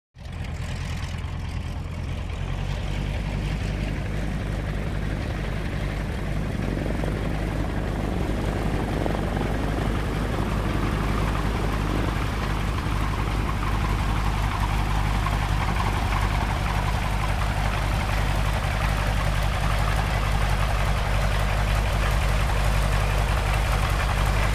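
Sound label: Stearman Taxi Throttle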